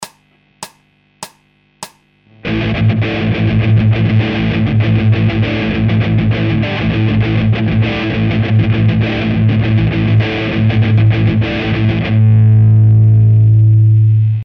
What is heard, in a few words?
Hard Guitar Loops